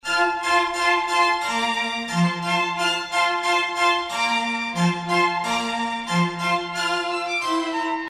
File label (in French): arrivee oiseaux en fa